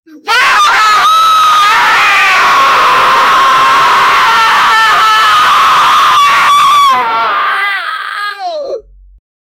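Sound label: goblins screaming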